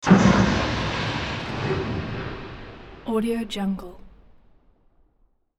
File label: small blowup metal structure far; matter mayhem; soundmorph